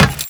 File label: Run Metal, Footsteps; Warframe; Teshin